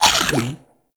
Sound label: Burger Pick Up; Argon; Warframe; Nineteeen Ninety Nine; Deep Archimedia